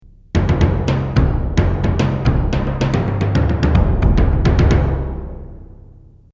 Ceremony Timp

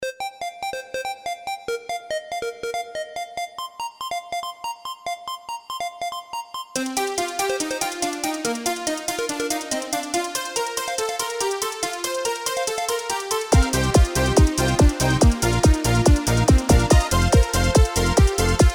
Yamaha QS300

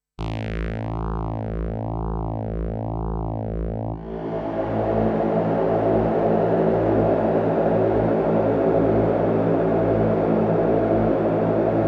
synthesizer; eventide h90

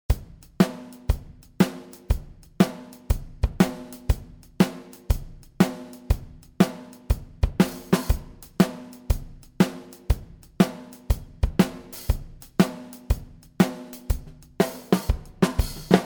Superior Drummer 3 Gretsch Kit

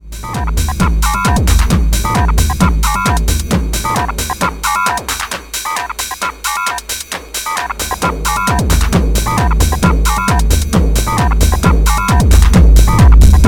EQ Sweep; DJ-Tech X10

